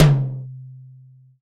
huge tom one shot d key

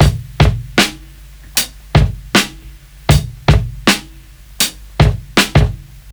78 bpm drum beat g key

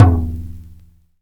mid tom drum single hit g sharp

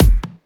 kick single hit f key; kick drum samples

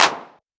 clap single hit f key; percussion; single hits one shots

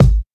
clean bass drum single hit g sharp key

